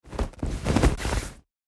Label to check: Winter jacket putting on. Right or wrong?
Right